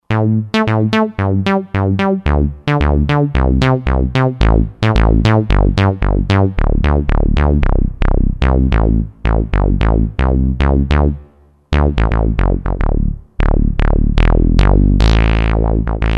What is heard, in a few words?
Synth Bass, Roland JV-2080